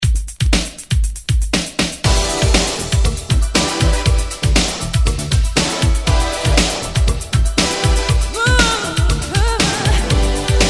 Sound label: 80 ReDrum; DJDOLLS